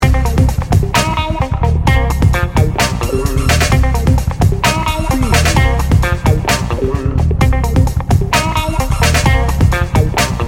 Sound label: funk in the booty loop